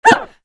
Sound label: attack, spirit; slender; dbd